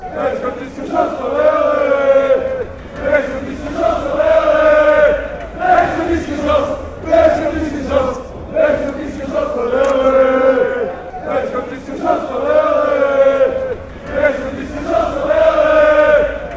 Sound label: beerschot chant